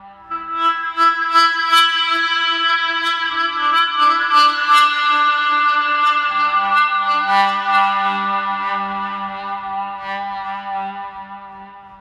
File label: Glass Saw, Synth; Сэмплы синтезатора (Эмбиент); loop; Ambient; 80BPM; ambient vol. 2